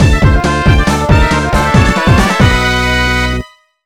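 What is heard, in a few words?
background music Battle Win; Irodzuki Tingle no Koi no Balloon Trip 2009 NDS gamerip; BGM Sabaku Battle Win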